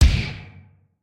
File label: spin Button Click; netent